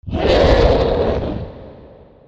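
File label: ATTACK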